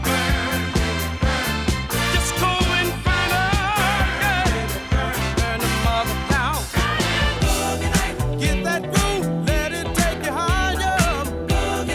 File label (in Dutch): DISCO STREAMS COMPILATIE